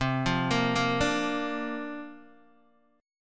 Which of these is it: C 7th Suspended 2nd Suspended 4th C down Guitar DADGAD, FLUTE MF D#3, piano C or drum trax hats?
C 7th Suspended 2nd Suspended 4th C down Guitar DADGAD